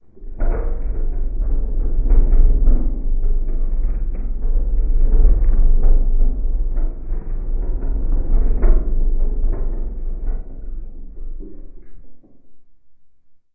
sound design effect metal object spin drum very slow; sound effects one